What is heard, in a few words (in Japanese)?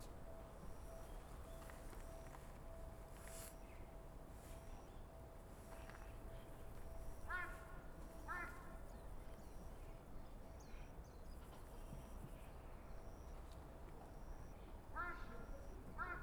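Stereo, 電車通過; RODE NT-SF1をステレオに変換し; ZOOM F6 MultiTrack Field Recorder; サウンドフォージでノーマライズしました。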